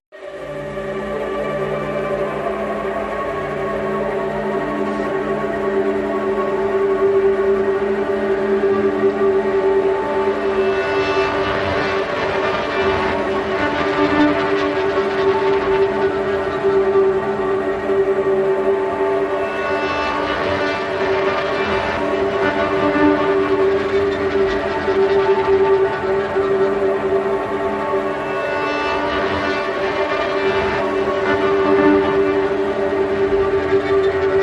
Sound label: Basic Mix